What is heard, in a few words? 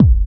DR. LOOP, DRUMS; X-Static Goldmine 3